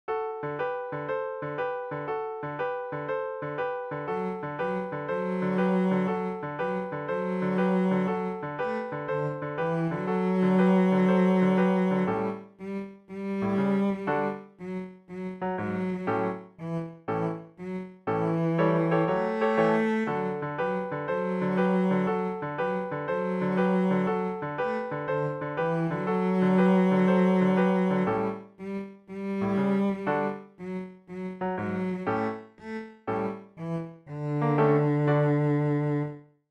boogie cello; jingle

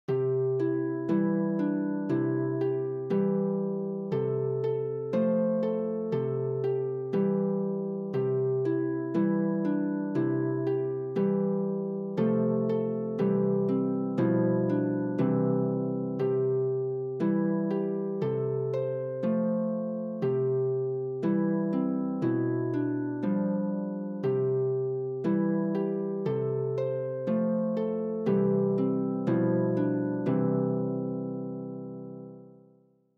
jesus loves me harp

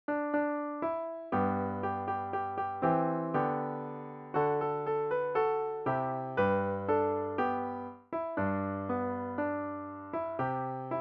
piano

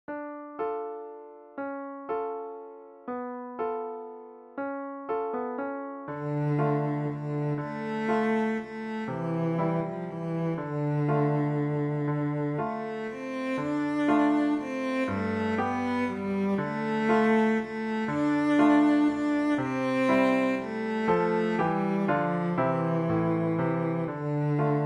cello

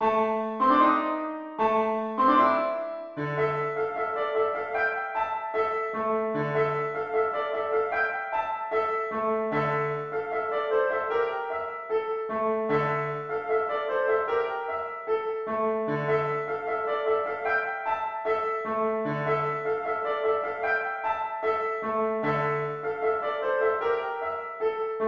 piano

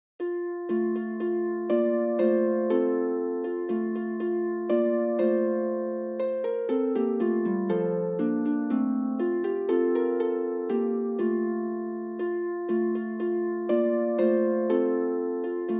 holly and the ivy harp

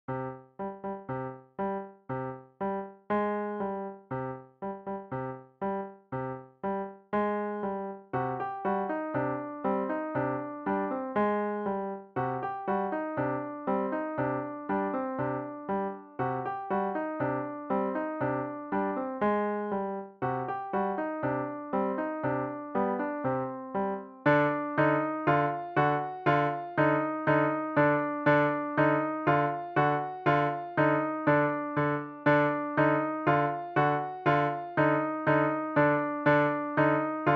piano

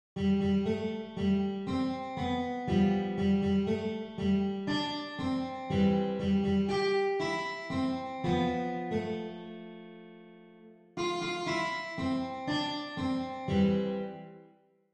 happy birthday guitar

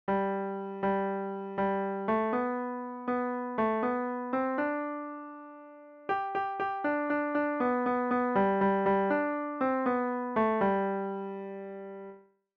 piano

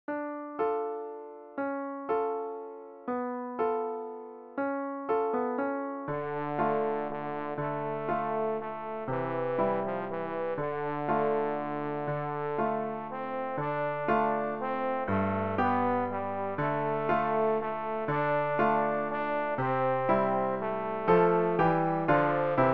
trombone

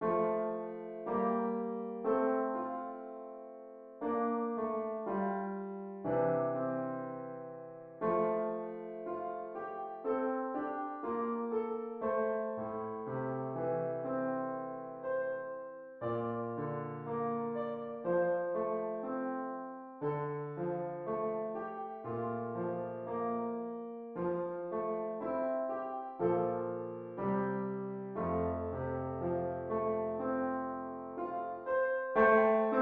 firelight and starglow piano

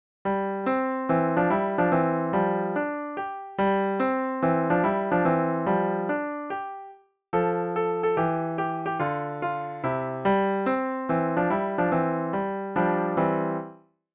piano solo

